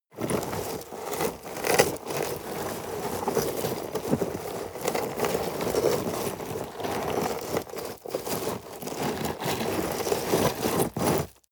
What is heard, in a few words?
scrape rocks long